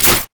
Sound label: rumble basic attack